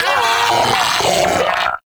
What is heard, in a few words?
Arachnoid Vocal Death; Enemies; Vocals; Warframe; Corpus; Arachnoid Hunger